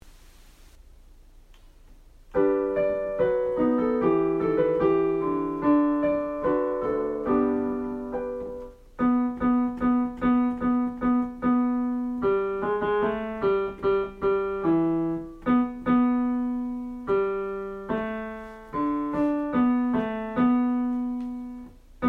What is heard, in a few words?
Tenor